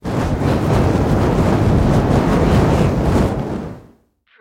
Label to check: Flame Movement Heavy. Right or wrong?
Right